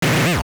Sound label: Door Unlock, A dungeon door unlocking; LOZFDS